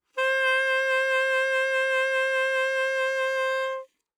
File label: Alto Sax vib ff C5, Eb Alto Saxophone; Woodwinds; MIS Pitches - 2014